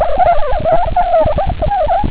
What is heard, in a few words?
candle, light to sound